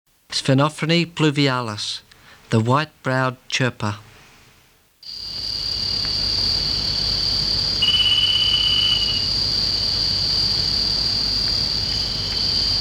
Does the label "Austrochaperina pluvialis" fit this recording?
Yes